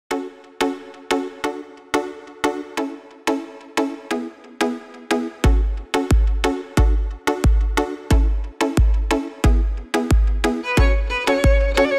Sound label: violin cover